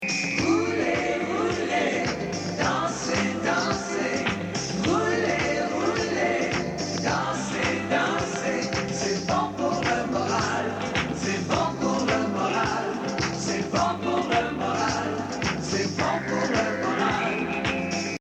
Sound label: Dancez Merengue